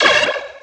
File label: next, visor, items; zaero